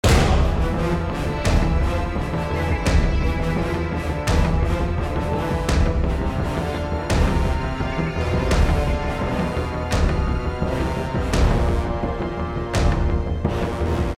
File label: Battle Loop; Infinity Blade 2